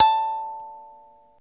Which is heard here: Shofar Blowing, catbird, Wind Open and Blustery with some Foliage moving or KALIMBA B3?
KALIMBA B3